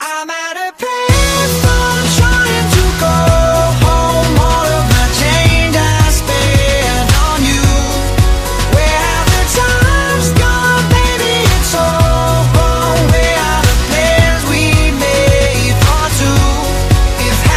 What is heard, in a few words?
Ringtone